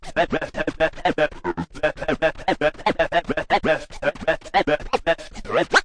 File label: Scratching and Turntablism